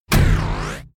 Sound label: battle attacker hit; hideout